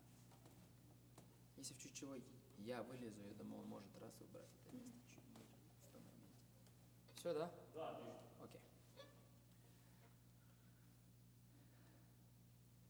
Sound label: Overhead